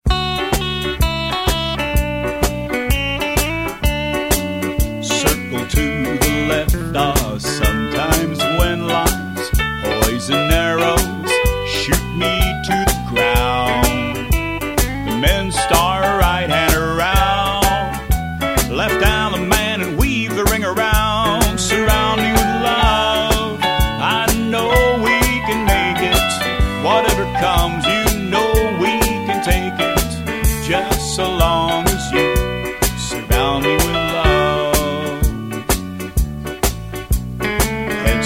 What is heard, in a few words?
vocal